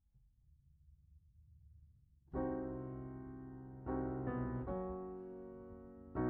Piano Mono

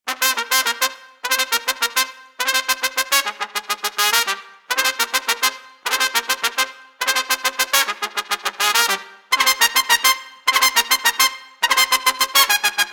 Trumpet, Jazz for piano

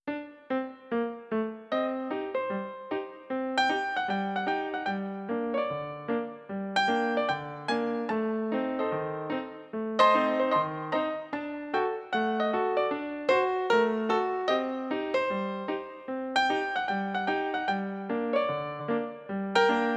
Ragtime piano

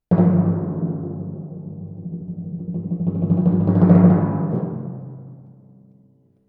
Timpani, Jazz for piano

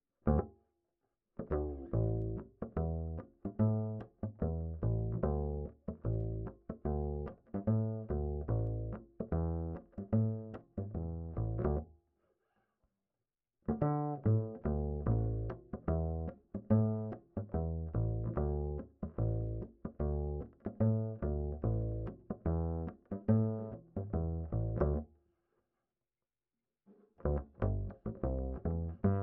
Bass Line

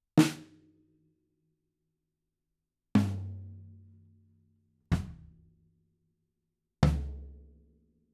Ride

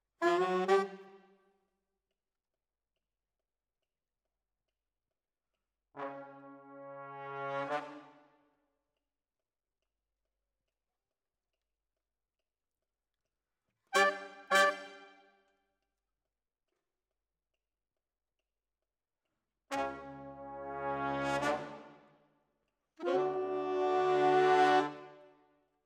Sax

